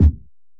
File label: step; Cleanomancer